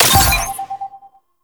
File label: Scan Pillar Burst; Warframe; Frumentarius; Scan Ability